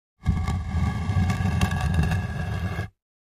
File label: 2x4 Dragged On Cement